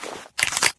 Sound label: pipe twist; dn3d r